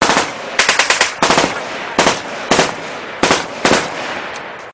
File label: Heavy Machine Gun Fire